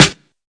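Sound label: classic hip hop Thick Snare; STYLE KITS (Hip Hop, Etc); Classic Hip Hop Mega Kit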